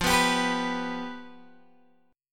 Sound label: F Suspended 2nd Flat 5th, Guitar, Open G